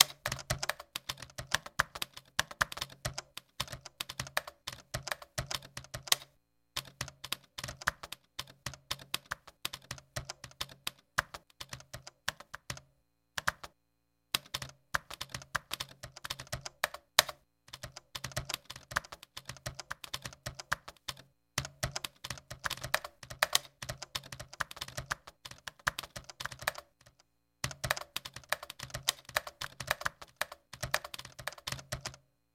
Typing 30 Wpm Looped

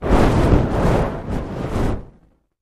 Fire Ball

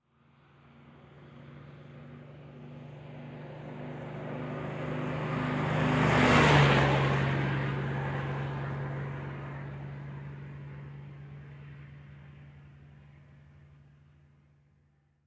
Dodge Power Wagon Fire Truck, By Medium Cu, Fast.

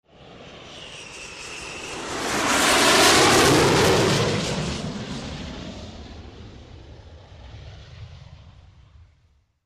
Jet Pass Low Taking O, jets; Jet passes low, taking off

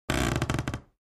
Creak

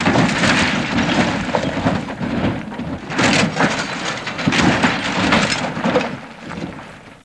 Car Rolls Over Multi

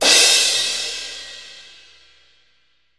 Cymbal Crash Medium

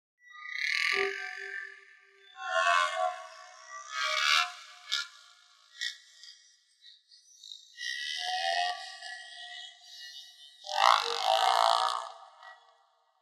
Synth Tonal Communic, musical speech

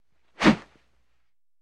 Baseball Bat Swing W; baseball fx & games

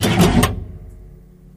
Metal Latch Spring, latches & bolts

